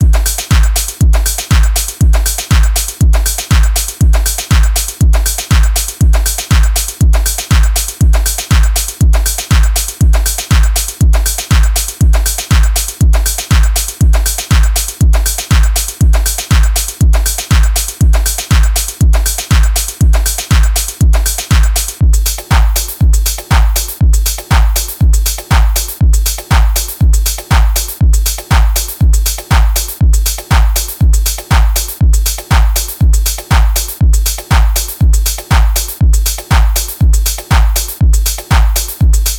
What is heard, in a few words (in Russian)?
чисто басс